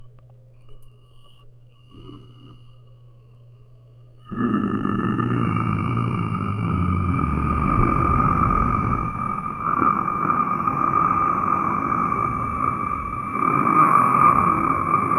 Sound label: soft goblin grunting